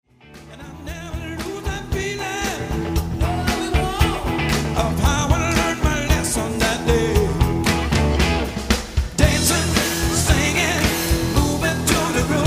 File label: funky music